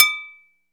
CAMBRA DINK, AFRICAN PERC; Greytsounds VOL-1

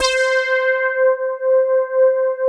POLLYMOOG C6; Greytsounds; Scott Peers Sound Engineering

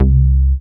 DM BAS, Dance Mega Synth

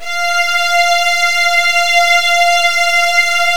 F2, Virtuoso Strings

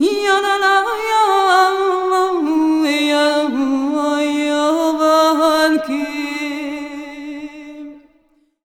VOX, Balkanish Traditions

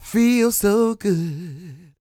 CROON, Vocal Blues & Soul MELLOW

